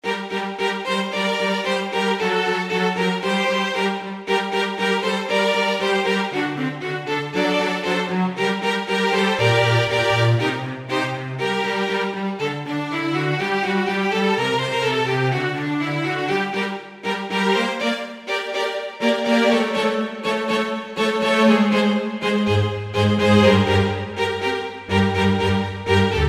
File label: Chamber Section, Kurzweil K2000 Orchestral; Kurzweil K2000 Orchestral ROM